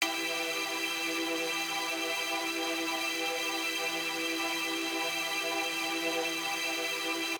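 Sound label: Rargh Organ E minor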